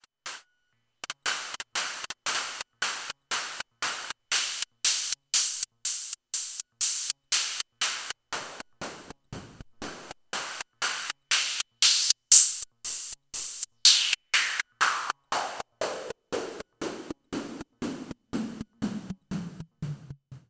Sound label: twin VC Filter BP Filter